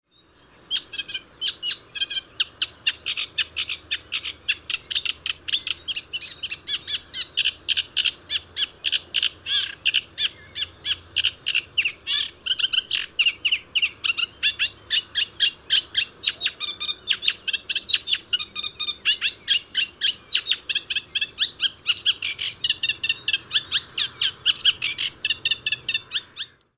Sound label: Acrocephalus scirpaceus